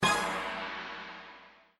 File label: WILD LAND; Lucky Wok